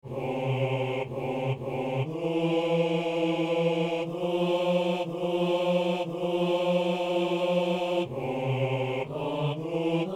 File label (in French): basse